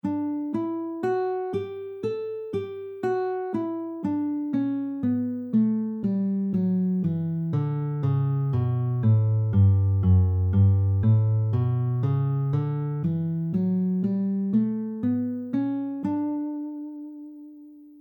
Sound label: D Major scale C position